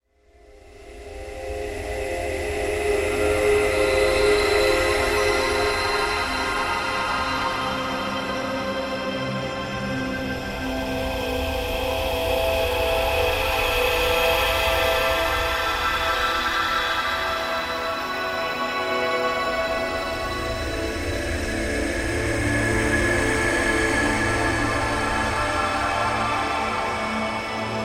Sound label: ambient elf forest